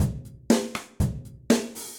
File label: Kit Brush Sizzle, Drums; MPE Kits; Ableton; Factory Packs; Session Drums Club